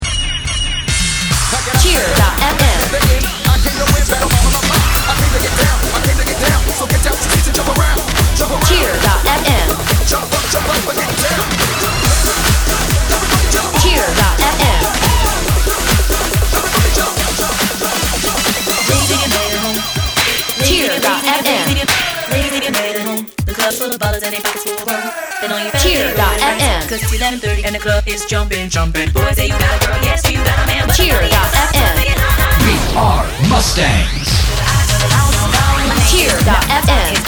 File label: Cover with loop; Claymont